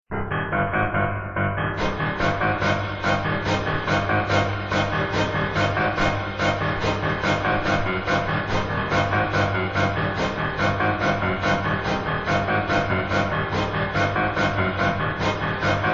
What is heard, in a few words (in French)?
Ambiance danger